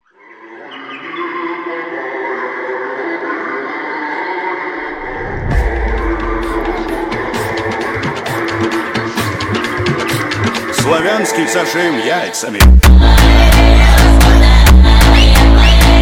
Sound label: Funk sped Up